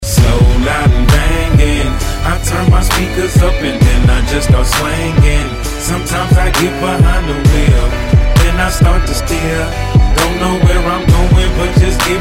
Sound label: slow loud bangin